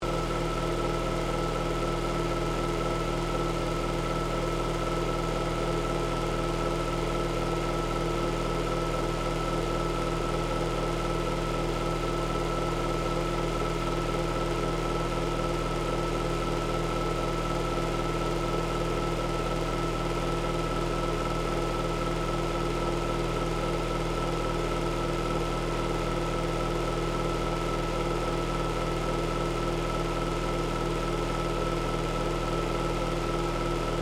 AIRCONDITIONER NOISY LOOP, A noisy motel airconditioner; Household; Air Conditioners